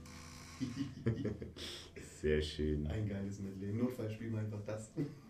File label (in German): hihi